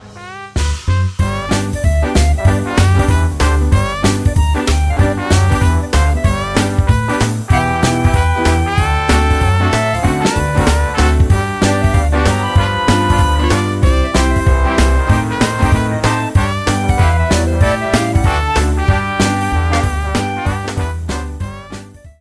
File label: Backing; When the Saints Go Marching in